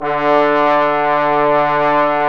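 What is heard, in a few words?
bones, Bone Sec, trombone section; Roland L-CD702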